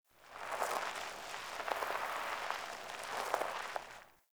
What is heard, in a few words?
Tire On Cement One Off